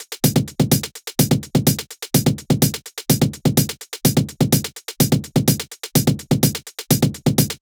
Future Rave Kit 126bpm Top; Drums; EDM Drums; Drum Kits; Stems; Ghosthack; Ultimate EDM Drums; Drum Mega Bundle; Future Rave Kit 07

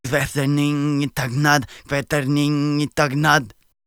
Battle Cry, Viking Vocal, Un-Processed

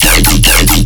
Bass Hit E, Dubstep; Vortex Void; Ghosthack; Demolition

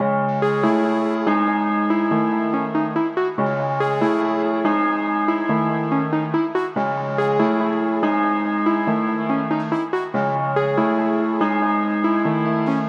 142 BPM C# Min, Melody; Cymatics; 2022 Melody USB Expansion

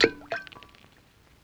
Ice Cube Plop In Glass; Vintage Cartoon; Foley

